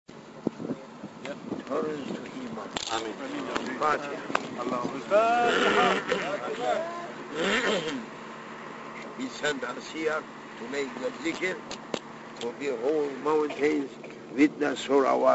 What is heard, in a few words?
Dhik; South Africa Tour; Table Moutain